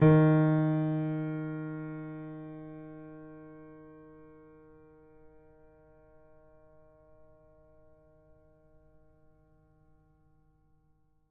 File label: D sharp 3